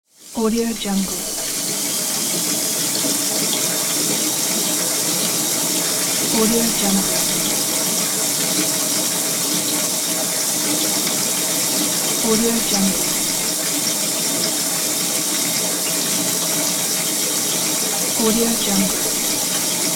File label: stationary bike